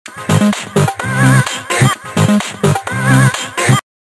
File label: Special Sounds Root C 128BPM; VEC3